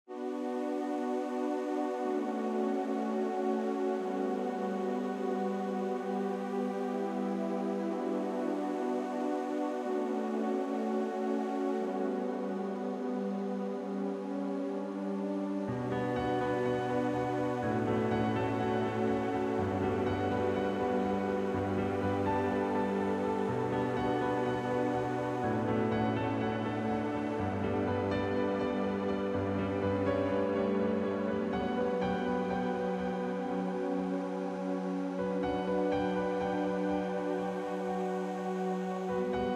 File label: Halloween pause